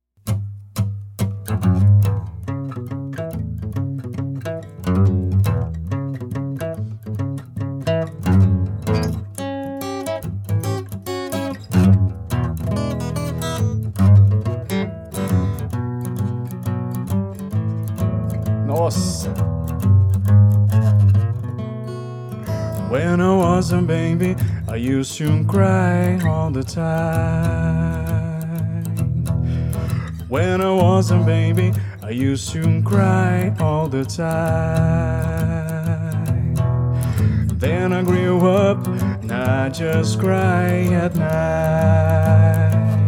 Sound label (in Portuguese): voz e violão blues